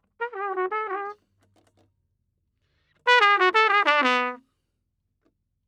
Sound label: Trumpet